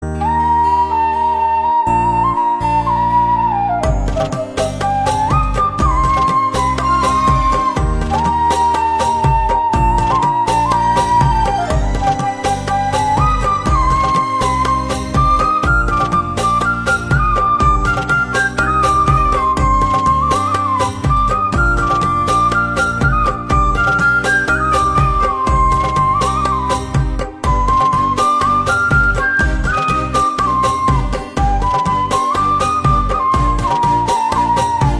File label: flute